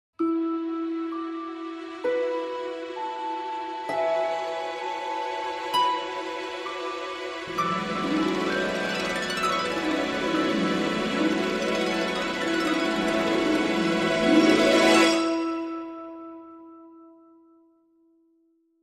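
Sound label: Dramedy Sting, No Percussion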